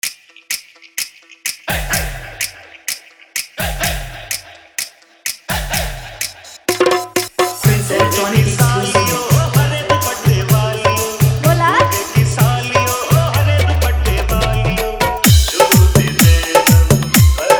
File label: Hard Faadu Dhollki Mix